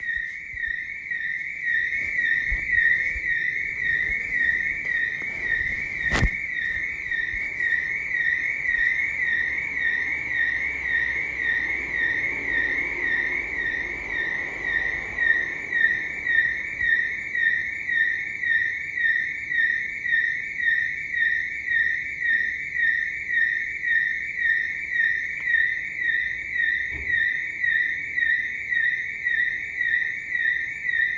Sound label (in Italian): SIRENA